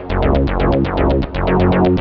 Alesis Fusion Bass Loop

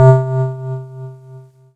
Yamaha PS 300 Vibraphone C3

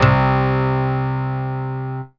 E-Mu Proteus 2 Electric Guitar C4

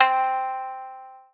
Roland SC-88 Shamisen C4

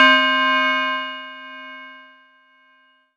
Ensoniq ZR-76 Big Bell C4

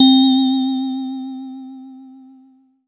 Casio CZ-5000 Synth Glockensp C4

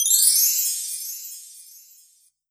Korg N1R Belltree

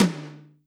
Roland R-8 Power Tom

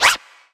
Scratch Push; Korg N1R

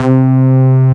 Casio CTK-611 Bass and Lead C3

